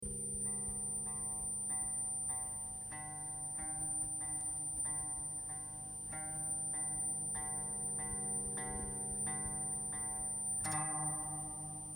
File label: koto